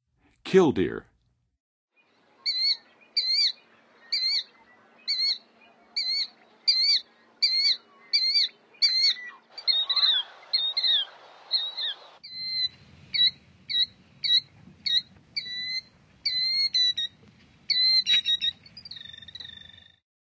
Kildeer, Birds of North America; Western USA; Western USA Songbirds

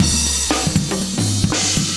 120bpm loops; Sound Effect Library

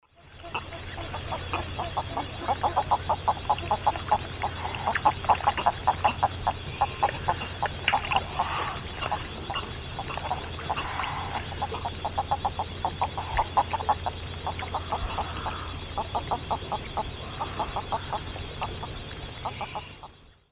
Mycteria americana, calls; Eastern Lowlands

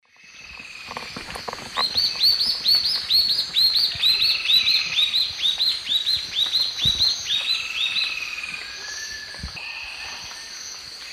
Hylophylax naevius, terra firme, song; S. America; Eastern Lowlands

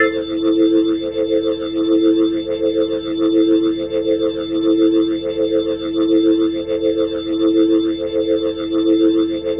Glowing Organ A Min and 8va; Minor; The Vitale Organ

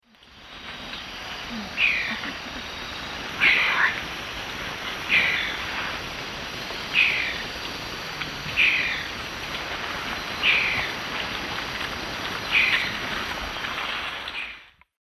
Nonnula rubecula, alarm calls; S. America; Eastern Lowlands

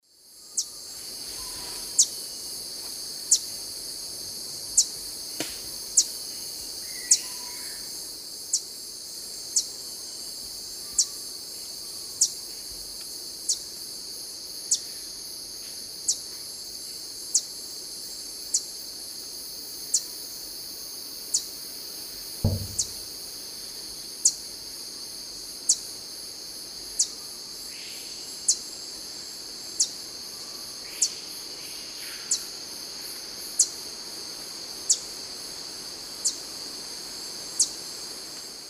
Chlorophanes spiza, call or song male; S. America; Eastern Lowlands

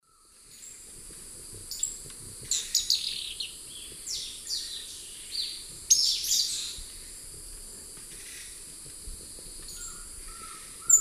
Pipra erythrocephala, lek songs and calls; S. America; Eastern Lowlands

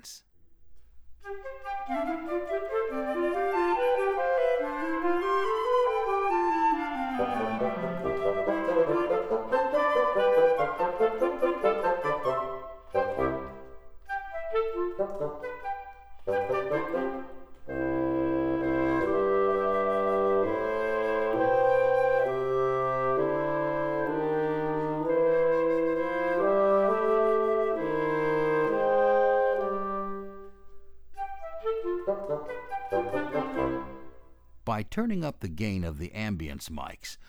Xy and Added Ambience

